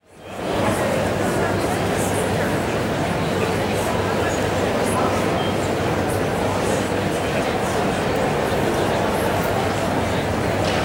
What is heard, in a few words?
Station Busy Train, Walla; Sound Ideas; Walla Series